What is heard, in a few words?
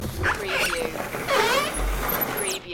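Slide Door Open Slow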